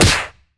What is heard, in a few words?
fight general